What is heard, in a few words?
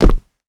land rubber, footsteps; player